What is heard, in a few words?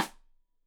Side Stick Stereo; Deeply Sampled Drums